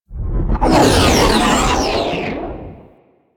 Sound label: Sentient Ship Close Short Fly By; Warframe; DE; Vehicles; RailJack; RailJackEnemyShips; Space Fighter Snt Coil Agent